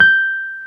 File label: PNO Wurly, G 6; Keyboards of The 60's and 70's